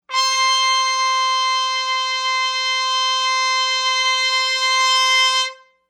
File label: playing Ds in octaves, Trumpet